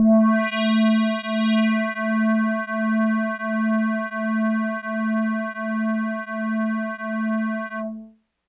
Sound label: Hologram Kawaii A2; keys